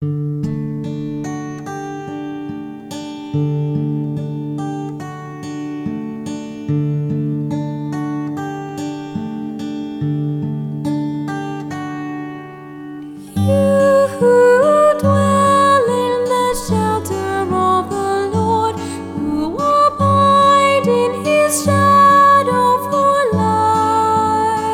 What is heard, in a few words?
Wedding Singer, hymns